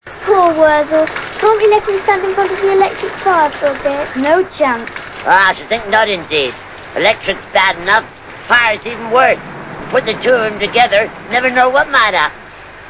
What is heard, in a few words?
rain; classictv; worzelgummidge